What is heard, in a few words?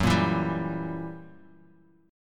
Fsharp 7th Flat 9th down, chord